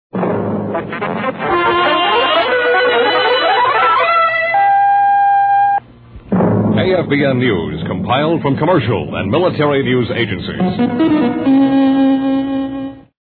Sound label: News Intro